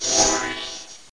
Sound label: points; FREERIDE